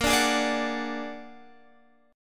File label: Bb Augmented 7th Bb down Guitar Standard; Bb+7 strummed; JGuitar